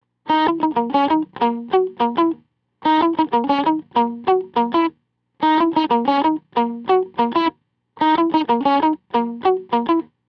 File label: distorted middle; Distorted, middle pickup